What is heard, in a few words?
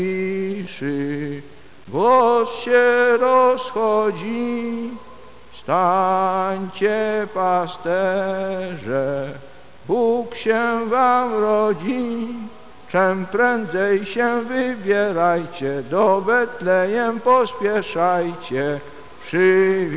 pope singing